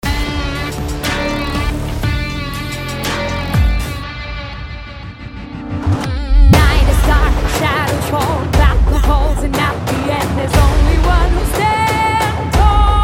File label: bgm remix ringtone; kaithi